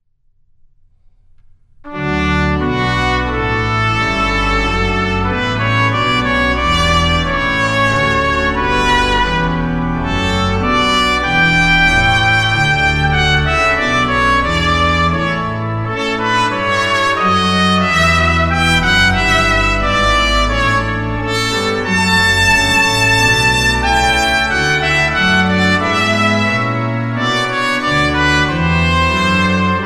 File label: Tuba Tune, Trumpet